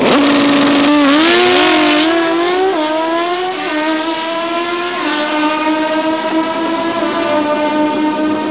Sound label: bike